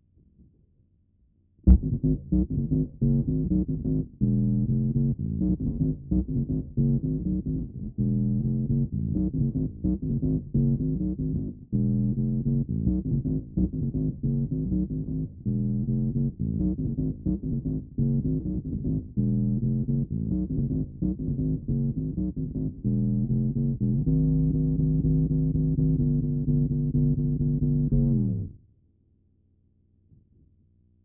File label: oct bass